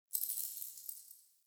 Idle Head Shake

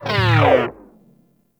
Proj Small Fire; Warframe; Lizzie

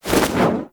Generic Spin Roll Whoosh; Warframe; Characters

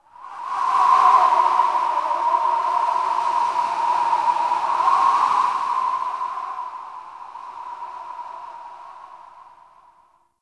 whistling wind; Warframe; Tau

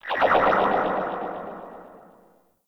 Ship Tech Chirps; Warframe; Corpus Ship Remaster

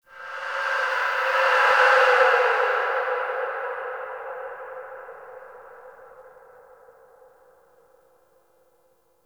Texture Interior Medium, Ambience; Warframe; Sentient Lair

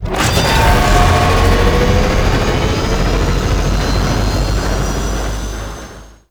Charge Stinger; Liset; Warframe; Awakening Remaster

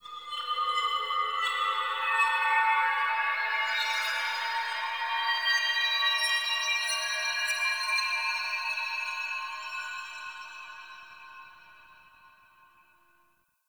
Chime Transition; Gameplay; Warframe; The New War; Mission Four

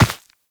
Run Dirt, Footsteps; Warframe; Operator

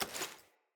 Generic Pickup

